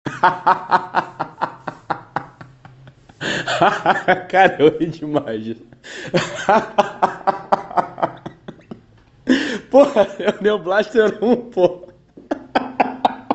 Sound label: neon blaster